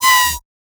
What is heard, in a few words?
Bass One Shot D#, Dubstep; Synths; Raptor; Cymatics; Dubstep Starter Pack